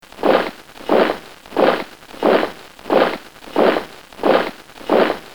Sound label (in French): Pas militaire